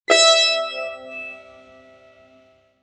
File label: tar, "mi"